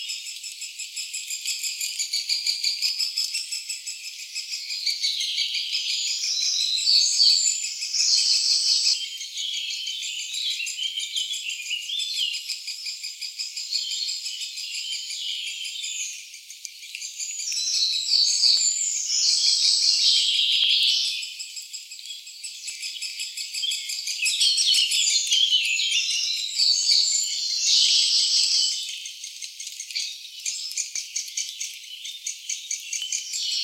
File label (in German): Buntspecht juv; Ornithologie